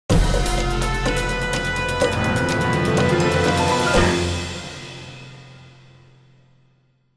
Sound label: Fight Win, music; The Da Vinci Code; XBOX